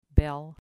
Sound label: bell